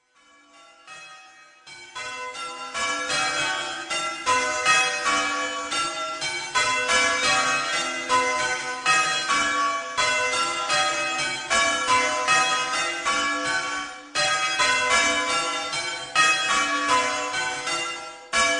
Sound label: St Bartholomew Bells, peal of 5 bells; Grandsire Doubles; The Bell Tower, built in 1628; Saydisc